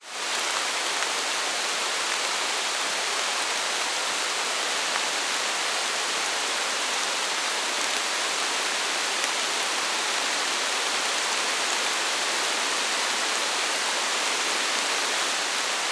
rain forest drips ground slight increase in intensity over time; zapsplat